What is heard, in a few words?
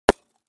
Concrete Chunk Hit